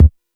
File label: Tech bd, Jomox Airbase99